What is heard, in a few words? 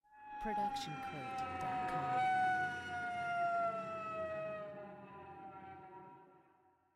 Cello Harmonics Long; SoundsCrate